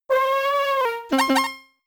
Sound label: Yawn; attack moves; Pokemon; Gen 4; DPPL HG SS